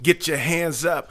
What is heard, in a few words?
Rap Vox